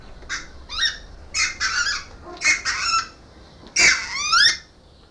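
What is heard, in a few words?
red-breasted goose voice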